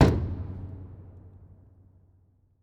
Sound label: metal impact